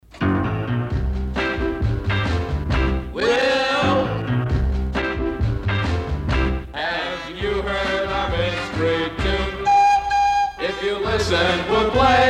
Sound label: Mystery Tune Promo Sung by DJs; WQAM